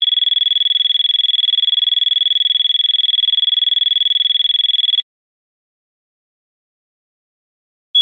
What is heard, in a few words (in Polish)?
ton